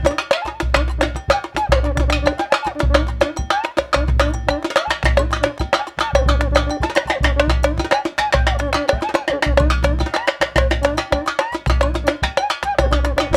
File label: RIO, Rio Batucada